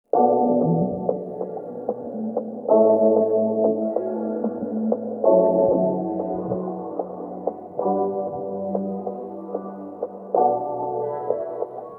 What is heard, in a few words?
instrumental; persian